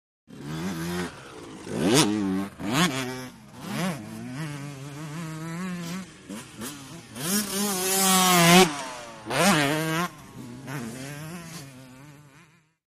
Motorcross Jumps By, motocross

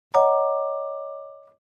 Clavichord Separate; keyboards

